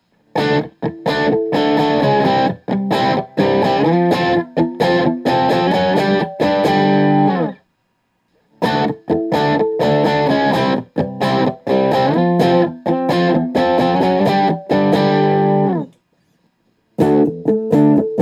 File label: Guild S60 A Barre; 1977; New Guild Shape guitar from the late 1970s called an S-60